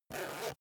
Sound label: zipper use short